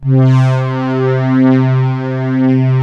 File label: PAD BRASS C3, Analog Fantasies; USB Soundscan vol.49; AKAI